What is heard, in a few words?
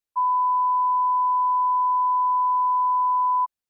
1 khz test tone